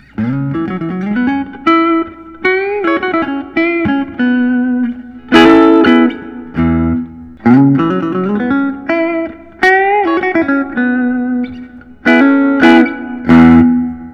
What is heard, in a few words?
gibson memphis 63 es-335td block